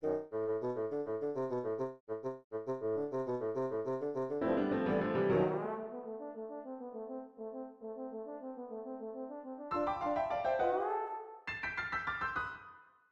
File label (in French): basson cor